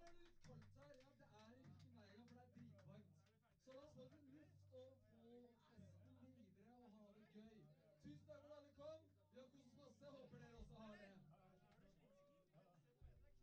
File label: kick